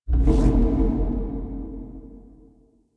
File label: Shard Menu Open Main; DE; Warframe; Helminth Shards